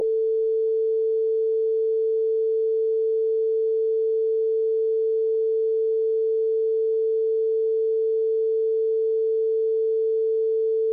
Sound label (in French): Diapason; Le son - La musique